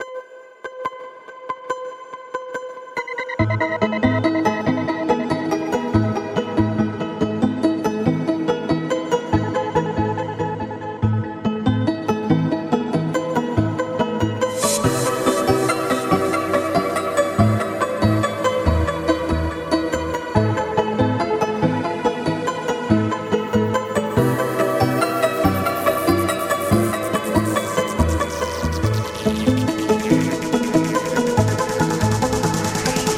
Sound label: dolphin trance mix